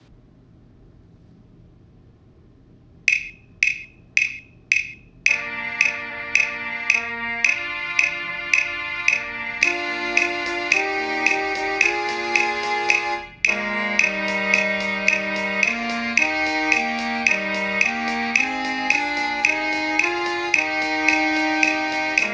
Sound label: tenderfoot full recording